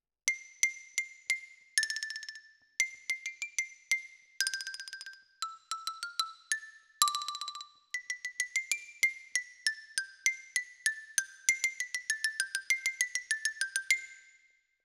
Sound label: Xylo Close